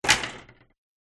Ping Pong Raquet

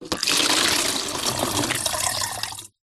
household water pour into plastic funnel

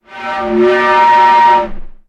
sound design effect air pipe pressure

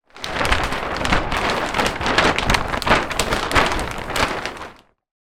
twister game sheet shake

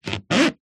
foot move squeak in flip flop; foley

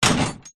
vehicles truck rear door metal close

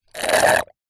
food drink straw suck last dregs drink slurp; zapsplat

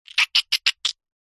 cartoon squelch squish mouth saliva

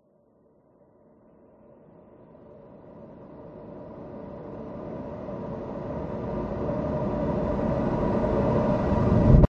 horror riser long dark eerie reversed; zapsplat

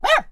animals dog bark small; sound effects one

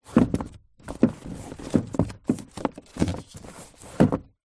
household books bookcase movement slide; zapsplat

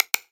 multimedia button click; sound effects one

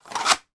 toy sword plastic place in sheath; sound effects one

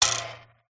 battery compartment spring ping; household

